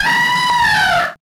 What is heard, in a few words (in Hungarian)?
elefant; The Wild Thornberrys Movie